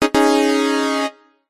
multimedia game tone fanfare synthesized brass simple; zapsplat